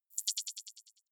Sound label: click decay, signale